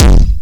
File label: Elekt E Kick; Yamaha PSR E305